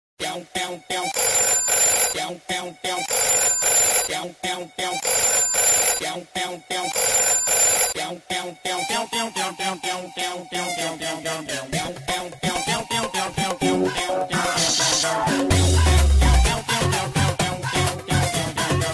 phone call ringtone; Bodyguard; Salman Khan

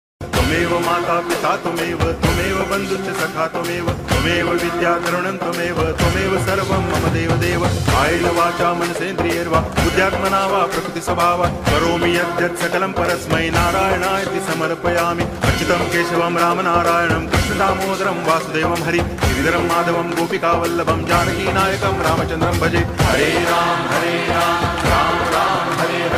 hare ram hare ram ram ram hare hare ringtone

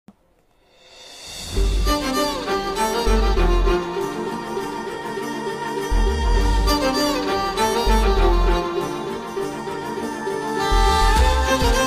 entry bgm; sir movie; samyuktha

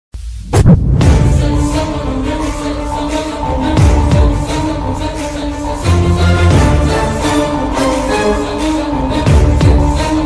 fighting bgm; iddarammayilatho; allu arjun

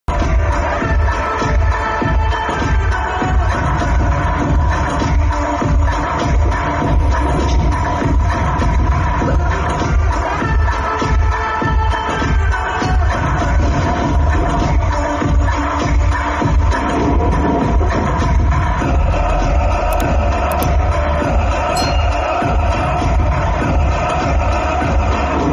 amigos movie climax bgm ringtone; kalyanram

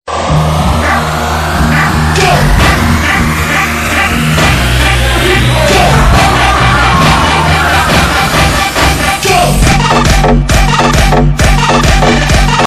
dj trance music ringtone dancing song ringtone

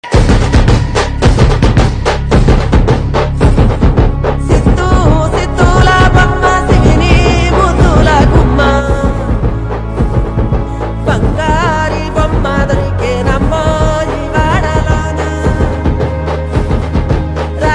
song background music; dasara

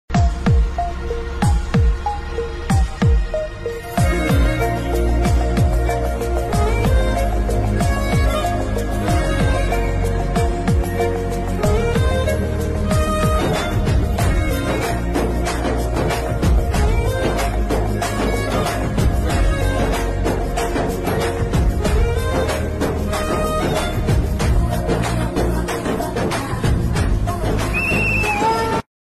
love track bgm instrument; mad movie; bus

